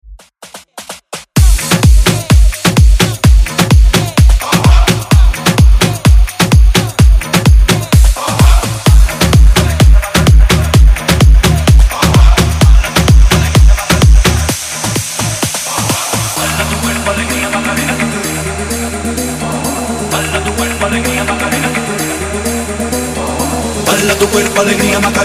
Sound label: Latin House Redrum